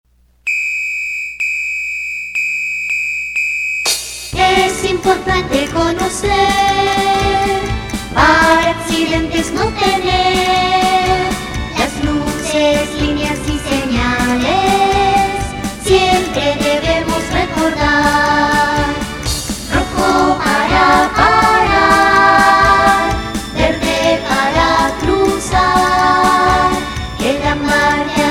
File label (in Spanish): musica cantada